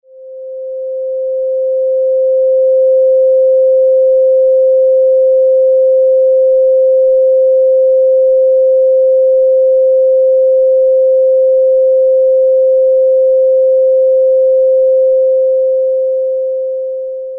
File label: c5 523.25 sine wave; c5 note